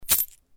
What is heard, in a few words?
Coins